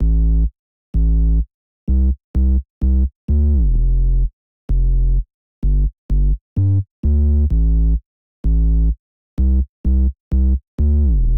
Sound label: 128 BPM A Min Sub; Drop Loops; House; Cymatics; Paradise